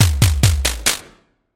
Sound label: Hi-hat push alert